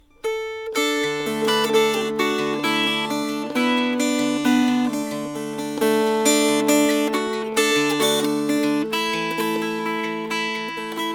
Fingerpicked

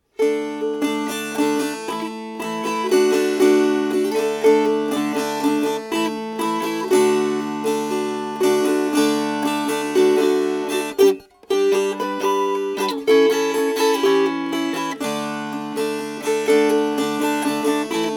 Strummed

